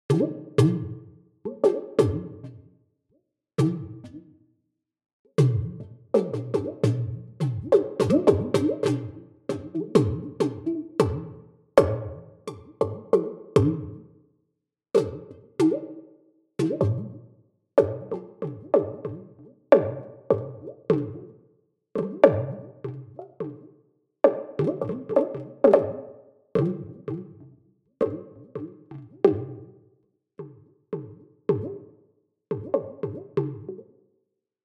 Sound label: String Studio VS3